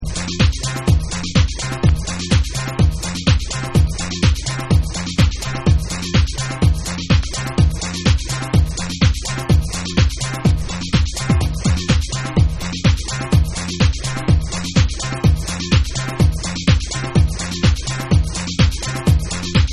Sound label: Vocal Remix